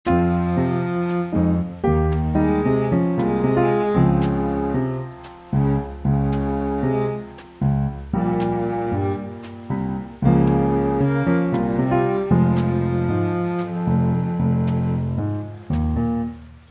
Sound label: careful dance trio, Fmin, 115; daily samples